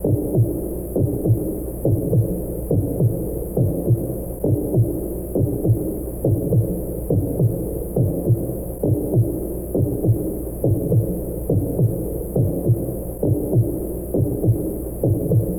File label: Heartbeat